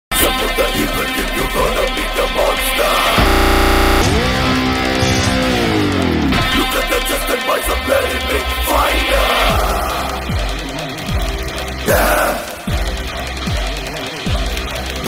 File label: bgm fight remix ringtone; dhruva sarja; pogaru